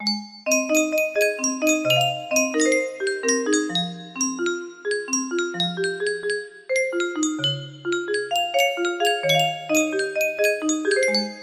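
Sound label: music box melody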